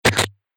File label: Crunchy Hit Impact; Miscellaneous Impact